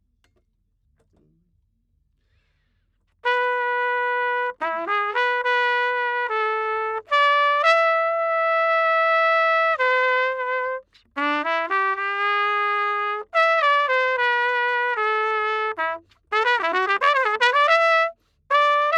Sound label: Trumpet